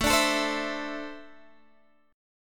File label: A Suspended 4th Flat 3rd, guitar chord; A4-3 strummed